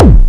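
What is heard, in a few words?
kick drum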